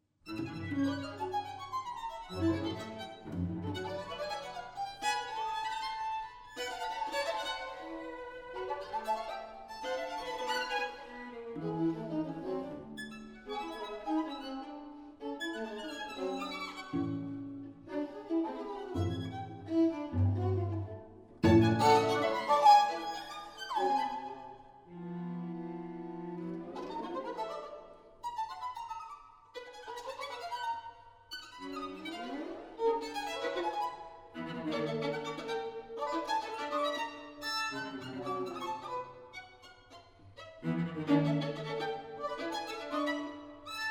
String Quartet; Edward Cowie; 'Birdsong Bagatelles'; Wren

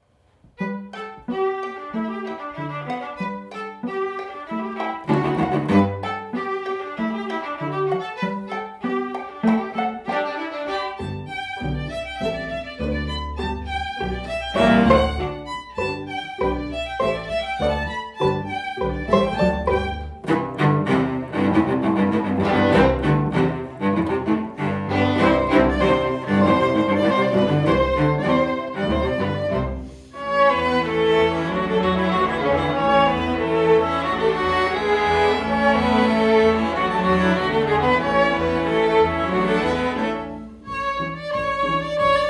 String Symphony; Frinton; String Symphony II

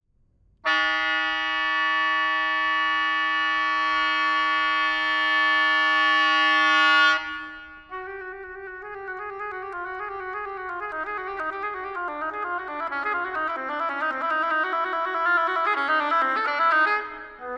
oboe; Cornucopia II; Philip Haworth

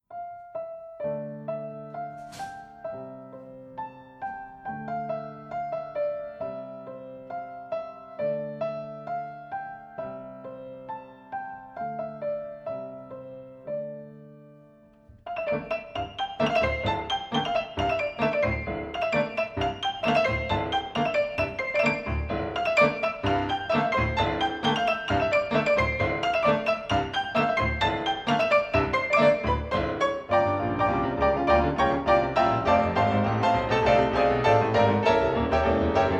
piano duo ending; Maltese

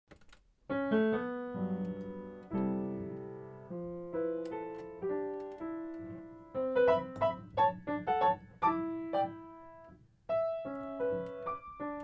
whimsical waltz; 12 Epigrams

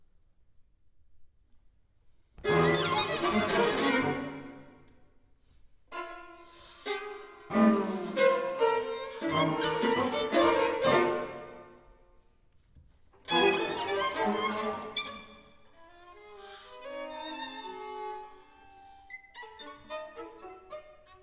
Quartet; Quartet 3

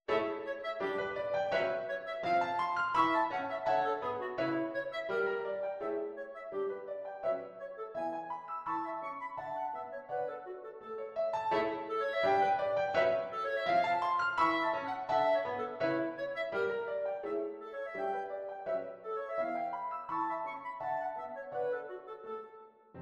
CLARINET and PIANO

